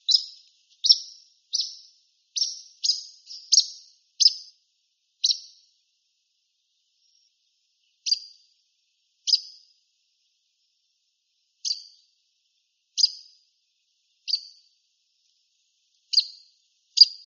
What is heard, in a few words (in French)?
martin-pecheur pie, chant